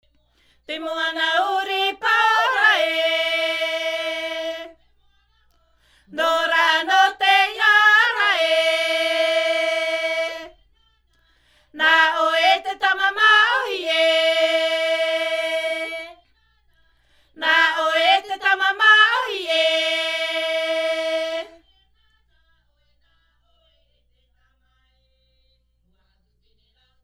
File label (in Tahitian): Himene tarava Raromatai; Tahape